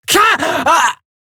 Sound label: death, female; Warframe; Eximus Grineer Female; Battle Barks